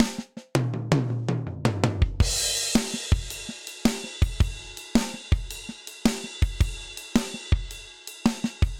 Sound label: Rolling Triplets Fill 109bpm, Session Drums Studio; Steely Tight; Ableton; Factory Packs